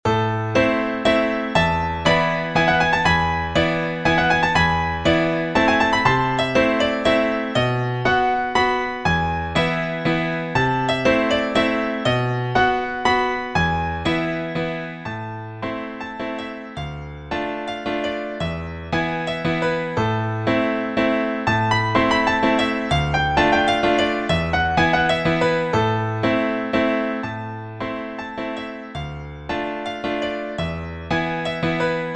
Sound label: Waltz